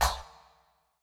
stand, blackjack

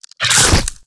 vampiric bite; battle

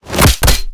combo kick, battle

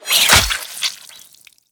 bloody dagger; battle

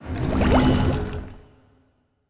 portal; pirates

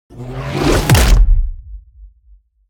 gutbuster punch; battle

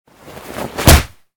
knockout hit; battle

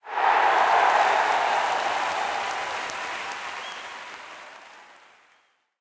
cheer, battle crowds

